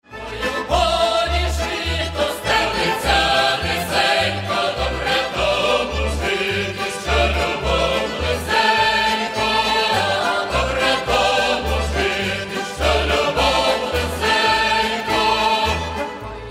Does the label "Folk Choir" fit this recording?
Yes